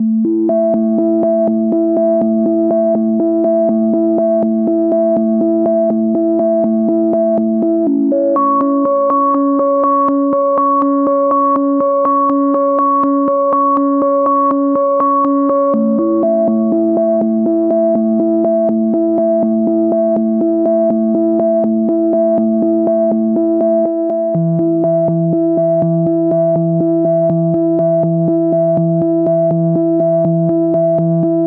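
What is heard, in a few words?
122 BPM Melodic Arps C major A minor Tonic D; MMB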